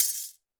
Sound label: percussion, Roland TD-20